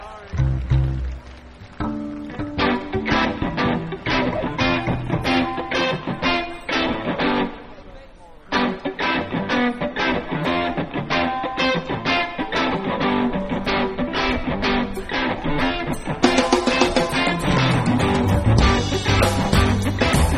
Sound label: glove jazz